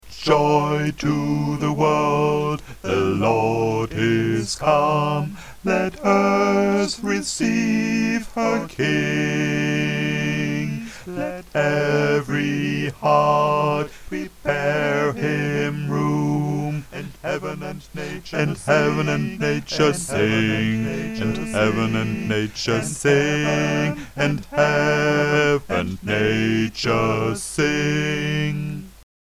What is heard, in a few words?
Bass, gospelchor; Joy to the Wolrd